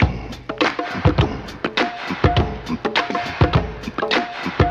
lotsa claps, Funky Drum loops

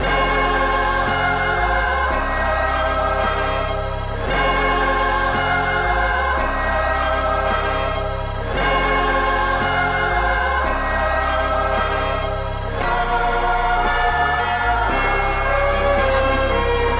angry choir; Loops; Electronic

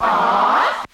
ah freak out; Voice; ooh ahh

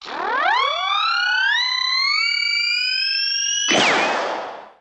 heating up; Crash Bang Boom

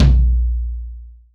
floop, Toms; Real

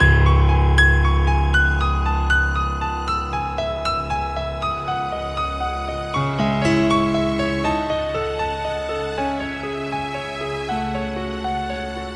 piano, Loops, Sad

